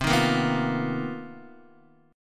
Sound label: B Major 7th Flat 5th C, chord shape; BM7b5/C; strummed; JGuitar